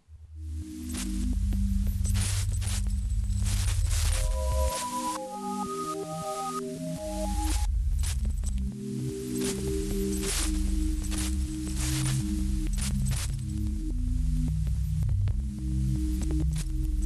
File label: With A Reggae Backbeat Soundcheck